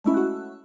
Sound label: key menu left right, keytone; Samsung SGH-T369 Linx